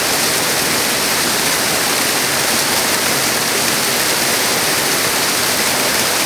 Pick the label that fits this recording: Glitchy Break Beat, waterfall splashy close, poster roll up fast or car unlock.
waterfall splashy close